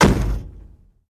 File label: Chevy Car Door Close